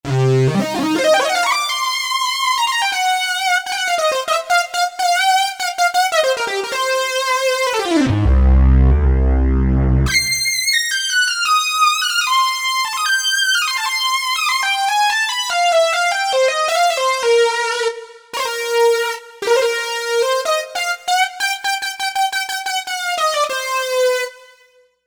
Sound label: Mono Lead Synth